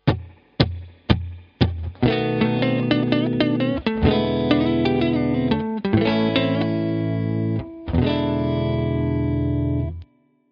blues, guitar

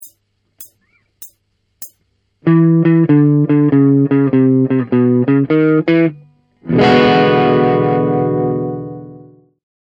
blues

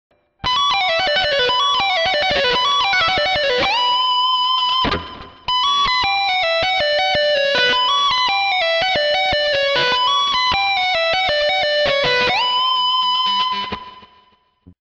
shred

